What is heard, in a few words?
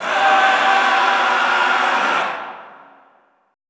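last scream, Classical Choir; Best Service; Extended Classical Choir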